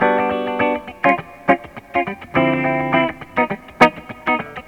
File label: GUIT LOOP, 70S; SoundFactory